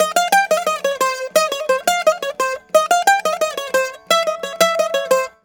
Mediteranean Traditions; USB Soundscan vol.53; AKAI

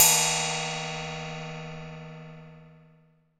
CYM GONG, Gong Scrapes; NorthStar - Global Instruments VOL-2

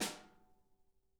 SNARE; drum

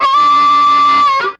harp; GOLD; Sonic Reality; American Heartland

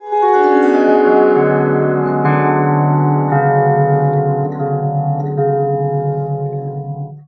Surmandal, Stringed Instruments; Sonic Foundry (Sony Creative Software); World Pop

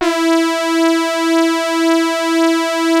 JX STAB E4, HARD BRASS; Music Store - Claudius Diemeter Schwerlos

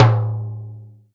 TIMBALE LO F, PERCUSSION; Sonic Reality; Interactive Drum Kits

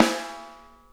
Interactive Drum Kits

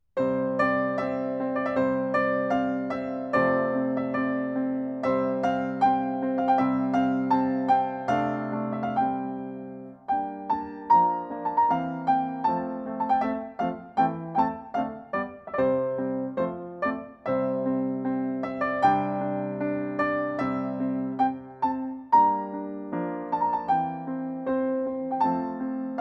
Tibetan Melody; Piano; Fourth Way

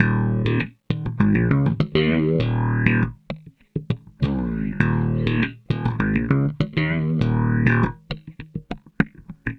Bass; Sonic Foundry; Not Just Another Pretty Bass

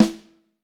Snare Drum

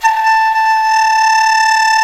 dizi A3; INIS; Opium

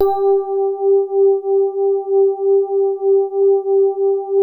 SWEETER G3, E-PIANO; Music Store - Claudius Diemeter Schwerlos

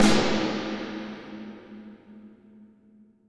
FX SD, Drums Acoustic; USB Soundscan vol.10; AKAI; 90s sample CD; ALL SD

